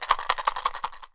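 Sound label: SM ELM RATTLE, PERC; AKAI S6000 CD-ROM - WorldSounds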